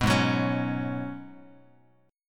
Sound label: Ab Minor 11th, Bass; Abm11 strummed; JGuitar